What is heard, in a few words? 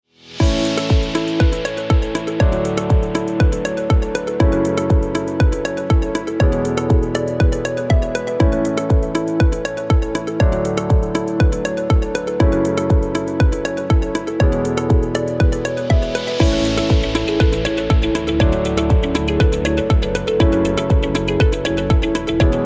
corporate upbeat